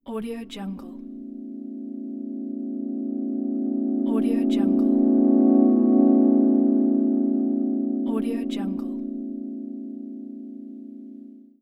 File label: tonal texture swell